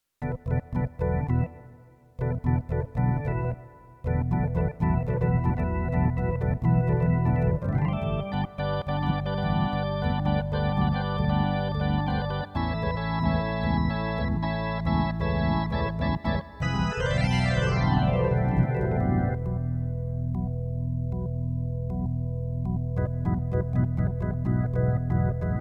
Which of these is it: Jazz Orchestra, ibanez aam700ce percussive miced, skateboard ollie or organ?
organ